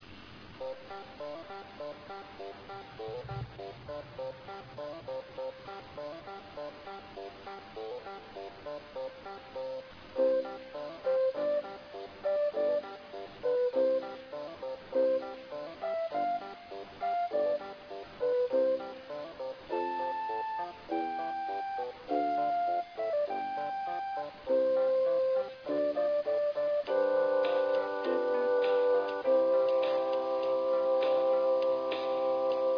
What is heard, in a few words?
taiko; 06 taiko